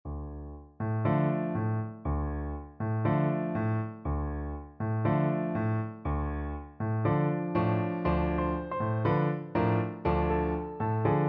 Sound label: piano, piano duet